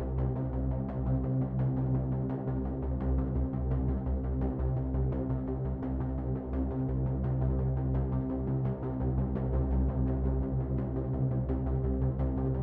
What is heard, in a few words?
Rhythmic Loop; Void Bastards